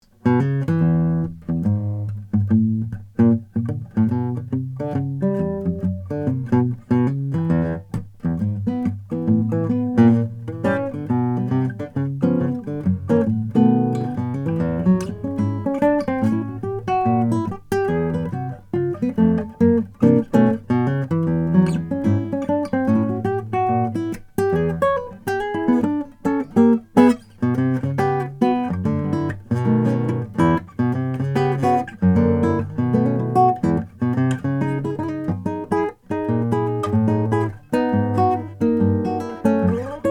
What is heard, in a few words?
matsuda classical african blackwood